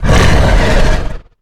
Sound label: Exertion Heavy; Evolve; Goliath